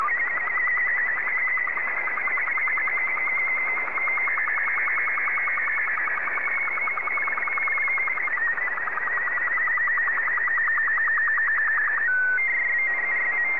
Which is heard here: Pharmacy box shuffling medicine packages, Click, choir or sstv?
sstv